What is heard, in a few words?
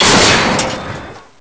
door smash